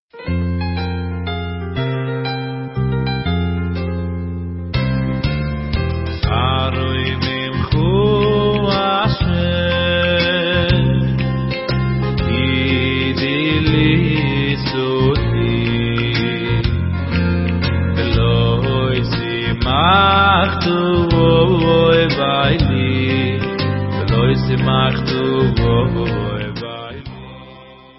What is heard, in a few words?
Choir; Meshorerim